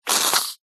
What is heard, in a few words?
food cream squirty squirt short